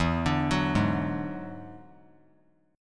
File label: E 9th E down; E9 arpeggiated; JGuitar